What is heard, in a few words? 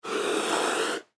Panther Hiss; Panthers; Animal; Panther Sound Effects